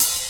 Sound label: high quality juicy open high hat a key